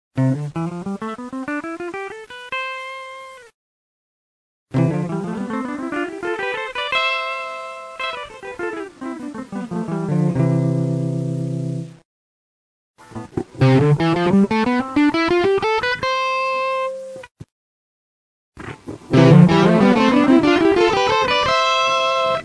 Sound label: Music Pitch Shift